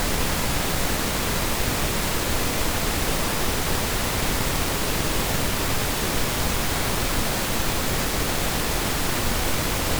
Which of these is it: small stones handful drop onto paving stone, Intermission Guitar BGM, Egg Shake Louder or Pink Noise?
Pink Noise